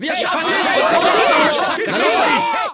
burst, Crowd German

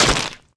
lemon wedge hit; bouncyobjects; Tony Hawk's Project 8; XBOX

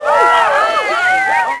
solo; WhiteOut

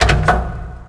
gui placeblock; Tony Hawk's Project 8; XBOX

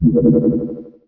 Landing Guide Engaged

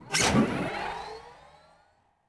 start, ships; Quantum Redshift; jaya

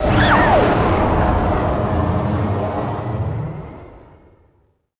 Fighter thrusters; vehicles; naboo

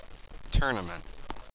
deny entry; Bicycle Casino

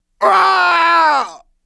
Bum KNOCK; TrueCrimeStreetsOfLA; XBOX

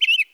Birdsong Oneshot; Totaled; XBOX

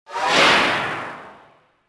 protect hit

